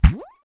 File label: collide